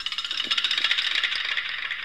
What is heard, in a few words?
rattle